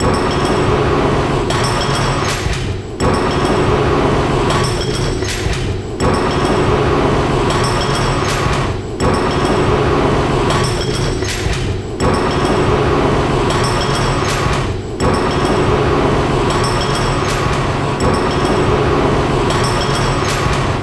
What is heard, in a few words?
Factory Machine